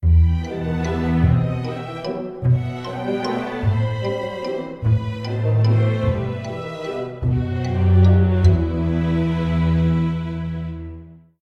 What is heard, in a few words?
dawn; Don't Starve